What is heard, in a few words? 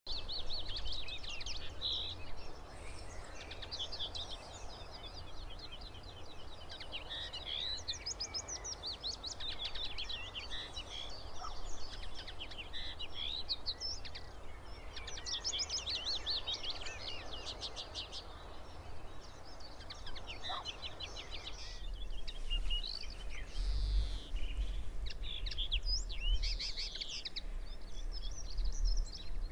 Common Linnet Singing